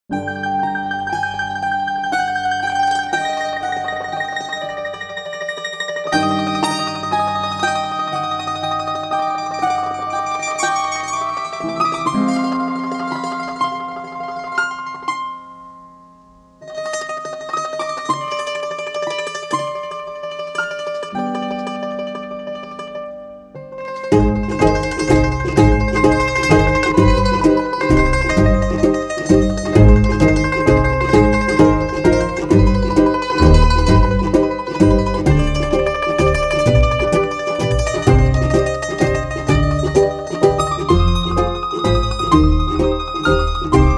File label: mando waltz